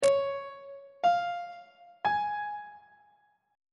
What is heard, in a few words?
b flat phrygian chord 3 is d flat major on treble clef